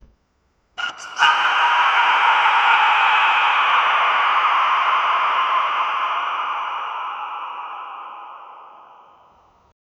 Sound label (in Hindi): bhayanak awaaz chudail ki, supernatural